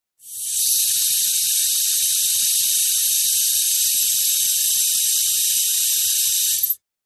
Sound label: Pressure Regulator Hissing